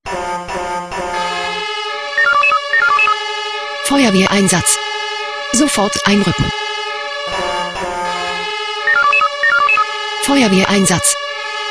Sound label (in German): SMS Alarmierungstone Feuerwehr Einsatz